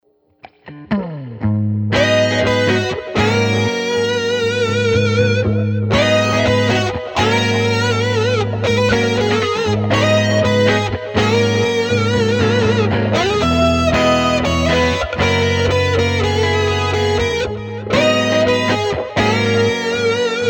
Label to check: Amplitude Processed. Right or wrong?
Right